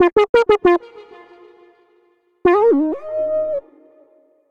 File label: Bb Minor 89bpm; MIDI Clips; Melodic; Evening Casual; Ableton; Factory Packs; Chop and Swing